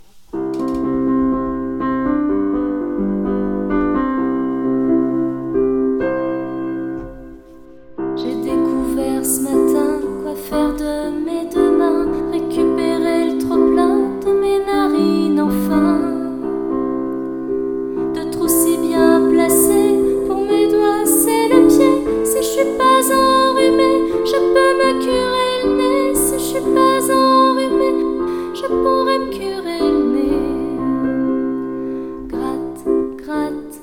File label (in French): Reverb, Extrait de "" chanté par